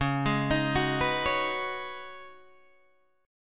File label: Db 9th Suspended 4th Db down Guitar Open G; arpeggiated